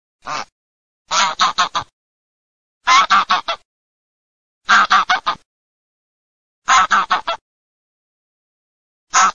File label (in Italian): OCA SELVATICA, birdsound